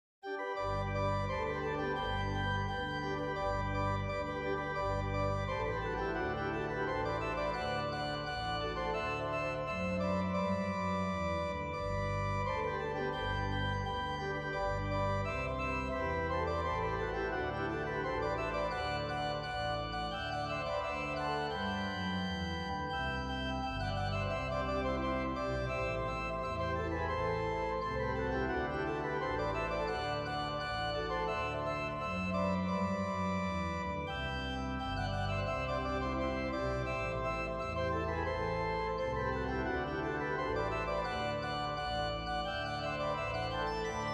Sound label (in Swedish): Schottis